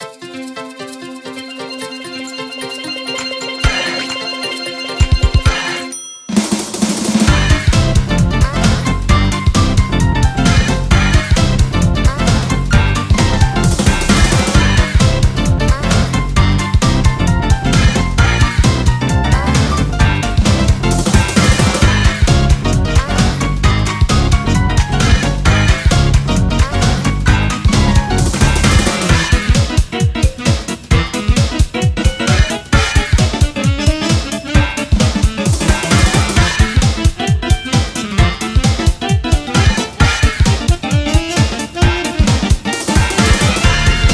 Instrumental

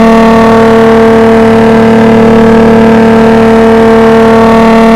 350Z on mid

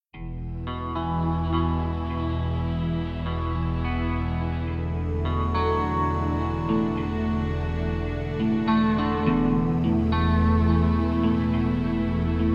Music Rhythmic Tension Ambient; starcraft 2 wings of liberty; MissionMusic

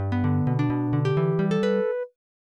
Floor Start Music; The Return of Ishtar; PC-9801

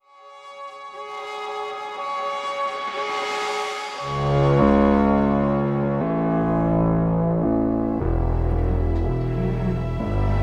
Heroic Action Suspense Oneshot

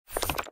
Ui Window Appear

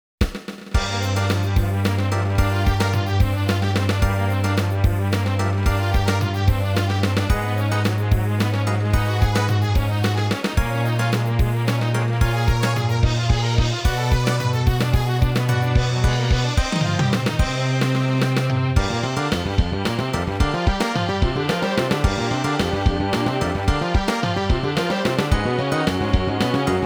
Shuddering Gong; The King of Fighters Kyo

